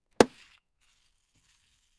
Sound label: tap sound